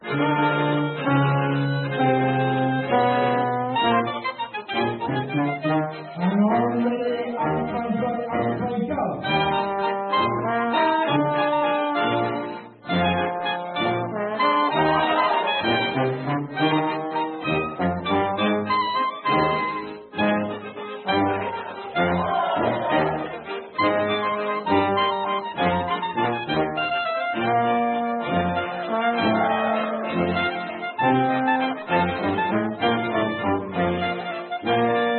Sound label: zillertal, oompah band